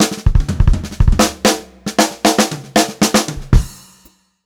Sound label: FUNKY FILL, Funk-Groove Drumloops; USB Soundscan vol.38; AKAI; 90 s Sample CDs; 120 FUNKY